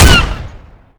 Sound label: bird gun; garrysmod